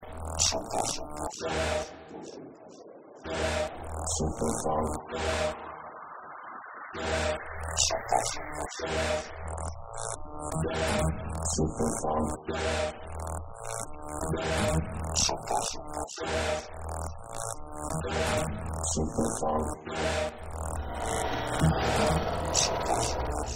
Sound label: bass fly robot funk remix